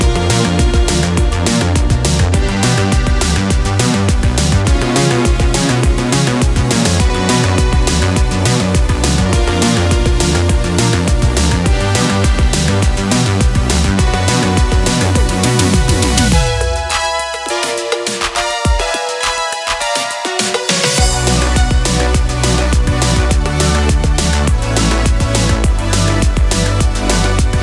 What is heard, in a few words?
Boss Bug Loop; Galaxy of Pen & Paper